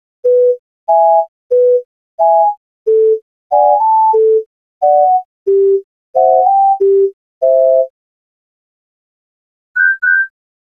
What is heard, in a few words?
Recorder